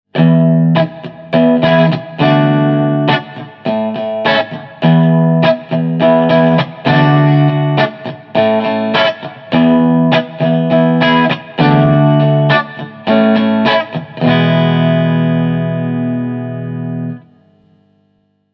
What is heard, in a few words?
strat boutique clean